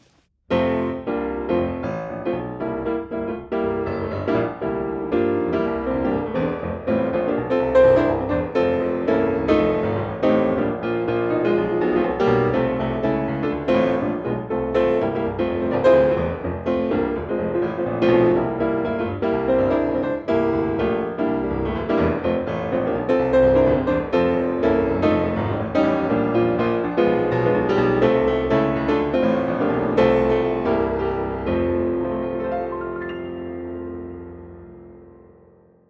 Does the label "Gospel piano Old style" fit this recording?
Yes